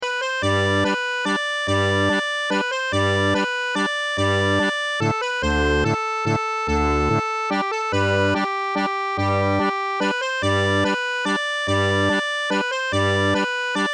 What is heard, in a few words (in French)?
Bourrée; des Grandes Poteries